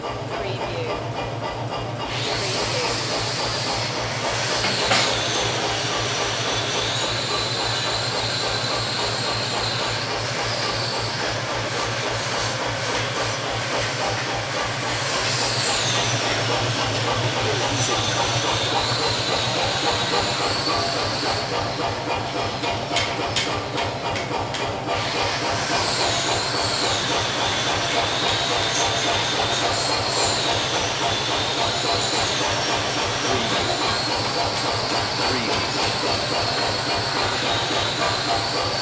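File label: Blacksmith Ambience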